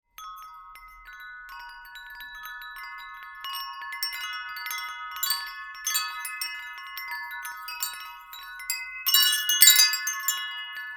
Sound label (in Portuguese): SINOS DE VENTO